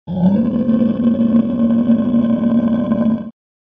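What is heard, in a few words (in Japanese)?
叫び声（ウオー）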